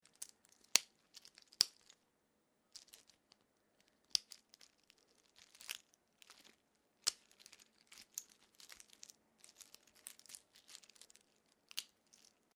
WRISTWATCH METAL BAND MOVEMENT OPEN CLOSE SEQUENCE